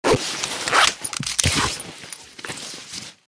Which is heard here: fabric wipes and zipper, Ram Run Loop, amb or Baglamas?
fabric wipes and zipper